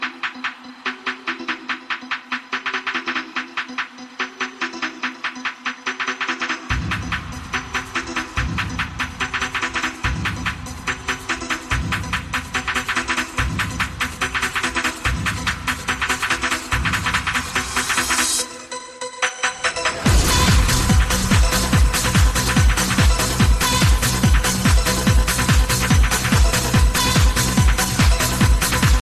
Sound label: trance disco iphone se new mix ringtone